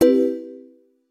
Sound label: error